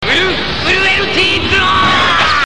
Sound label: wolf